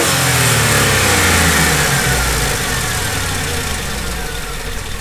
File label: ENGINE DECEL